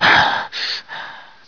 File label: gasp; sorlag